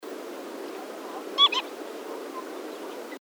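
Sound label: northern lapwing, birds in flight; seelhaus see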